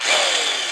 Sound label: DRILL